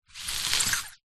office plastic document folder wallet push slide wood desk